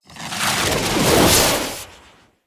Siege Engine Cannon Projectile By; Weapons; Enemy; Artillery; Ode Siege Engine

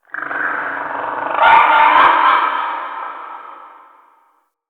Amb Corrupted Animal Distant; Ambience

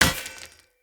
Short Sword Throw Medium Impact Rock

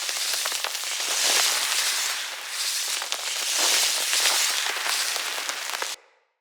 Camp Voltaic Fire Torch Loop; Props

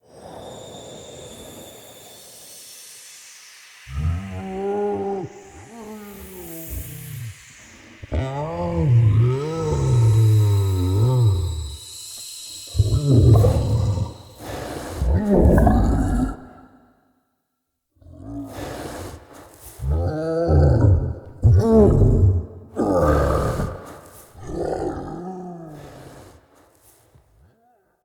Acorn Cleanse Grunts; Cinematics; Bromius; Bromius Quest Acorn Cleanse

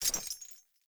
Grunt Foley Melee Swing Chains; Enemy; Sinecure

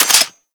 Heavy Shotgun Alt Mech B; Warframe; Tenno

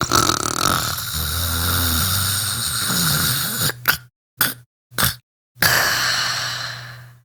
Snores, Daughter, Vocalizations; Warframe; Deimos

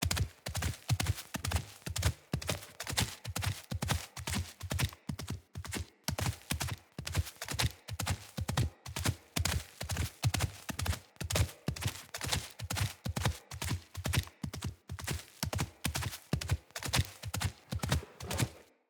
Pack Wolf Steps Run Loop; Animals; Footsteps

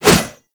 Pole Arm Whoosh

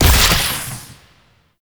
Damage Zone Place; Gameplay; Warframe; Nineteeen Ninety Nine; PvPvE

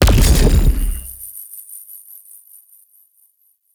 Void Angel Portal Beam Fire; Abilities; Warframe; Zariman

